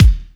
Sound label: Soft Kicks; Vengeance Samples